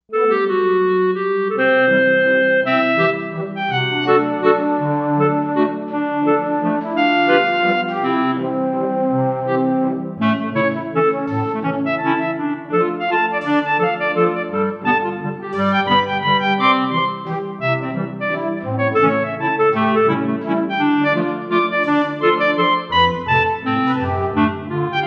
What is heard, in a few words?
Woodwinds, Korg M1Rex; Factory Patches